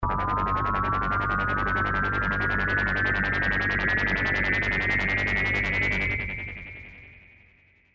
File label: arturia cs 80 ring